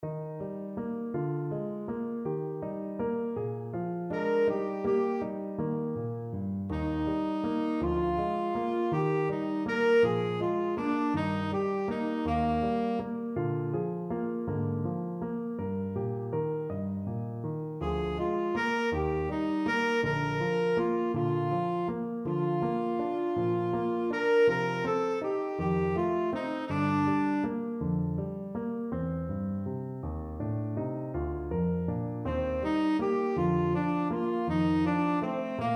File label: tenor saxophone